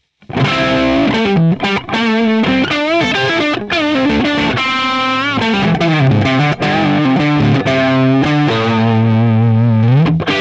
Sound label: Epiphone Les Paul Neck Distortion